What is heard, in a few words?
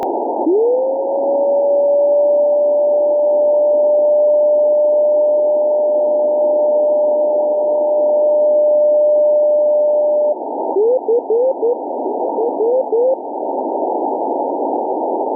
buoy a1a; buoy a1a 2